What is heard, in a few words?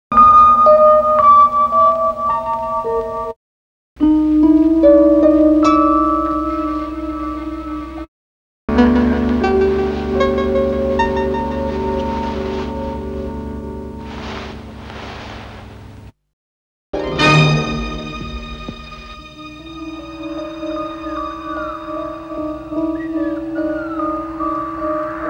breaks, Jungle; Zero-G Jungle Frenzy 2